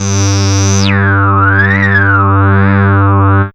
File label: synth g, Techno Synths